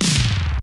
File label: PERC EFF, FX PERCS; Crypton - DrumBase5000